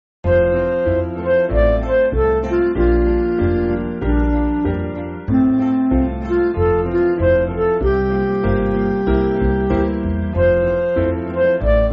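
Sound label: Midi, Backing Files for Churches; 3/F Chord Sheet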